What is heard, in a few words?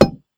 metal pot hit